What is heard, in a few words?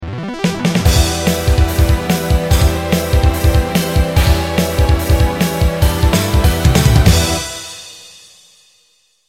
Tango TV Jingle